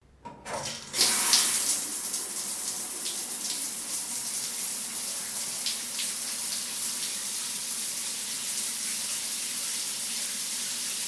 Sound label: Shower